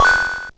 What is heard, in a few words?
money